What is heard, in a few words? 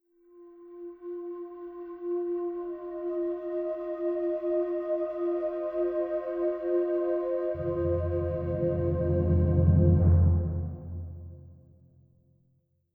mus north monster intro; bluebyte